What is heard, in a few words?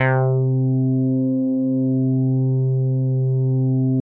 filter